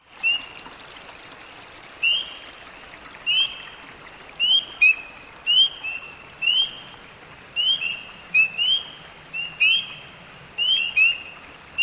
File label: peepers